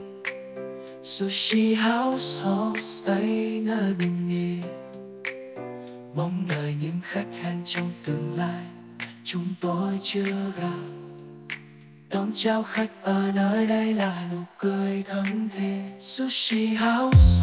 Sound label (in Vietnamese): Doan nhac dau